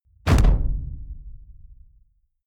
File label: sci-fi space pirate gun fire resonance; pistolen gewehre